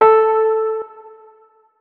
notes, keyboard, electric piano

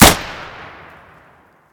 m1a1, weapons thompson; Tommy Gun Drop

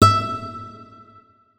notes, guitar; Hideout Furniture

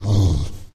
lurk, Angry Chimera Growls; TBs Angry Chimera Growls

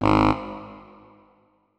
notes, saxophone; Hideout Furniture

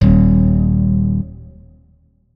notes, guitar; Hideout Furniture

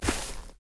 default, human step; Footsies

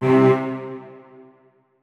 notes, keyboard; Hideout Furniture

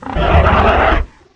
flesh panic, monsters; Soundscape Overhaul

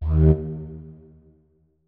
flute notes; Hideout Furniture

